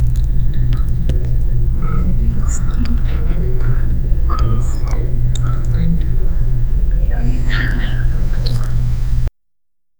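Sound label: silent whispers of several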